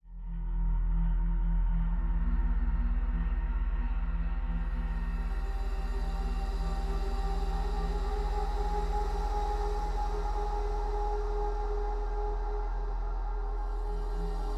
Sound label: New Layers Dark Ambient